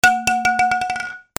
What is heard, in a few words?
FALLING